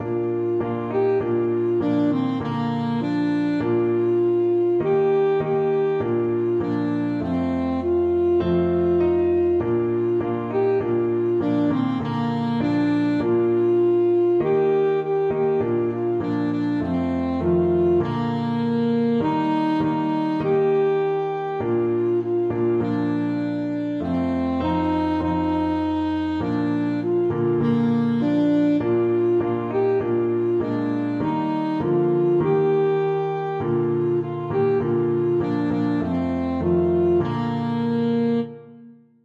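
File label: alto saxophone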